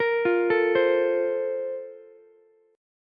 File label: A sharp Major 7th Suspended 2nd, Ukulele; A#M7sus2; arpeggiated; JGuitar